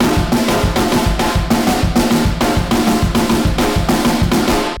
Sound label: fill